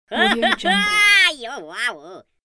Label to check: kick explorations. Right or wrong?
Wrong